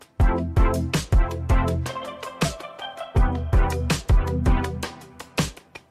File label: lobby